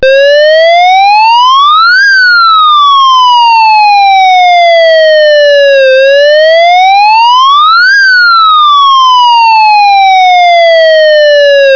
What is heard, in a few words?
police